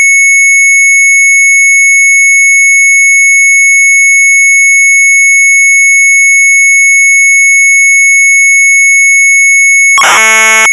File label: MZ800 Tape; sharp; SUPER PUCK-MAN; retromelsarchive